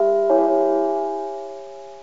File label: metro; sampleplayer